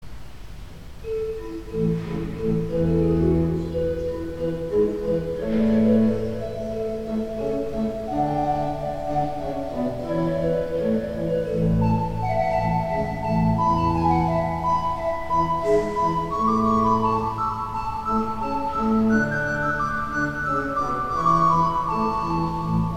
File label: Organ, The Fifers; Francois Dandrieu; St. John's Cathedral, Jacksonville Florida